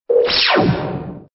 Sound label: Stinger; STINGERS